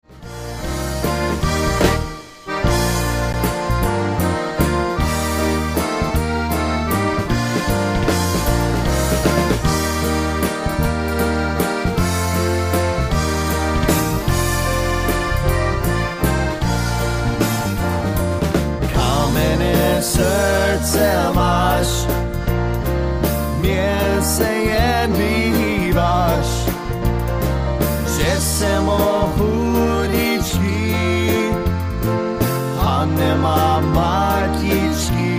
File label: Waltz